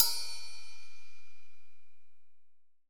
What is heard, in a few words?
AMB, AMBIENCE HH